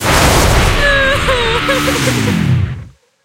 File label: lose right girl; Wazdan